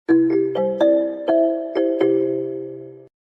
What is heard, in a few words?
cercanias jingle; renfe